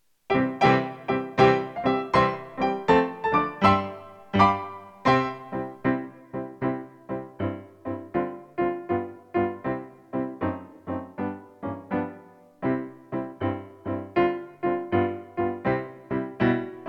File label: PIANO accomp; Pirate King